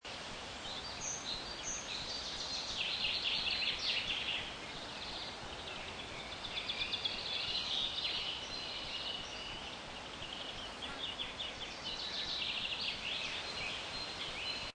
Birds In Light Forest Daytime Loop, Breeze through trees, light forest ambience, occasional inse; Seamless loop.